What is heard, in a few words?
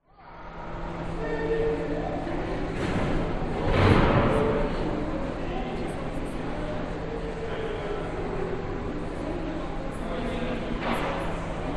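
public places museum interior busy people banging of construction; brisbane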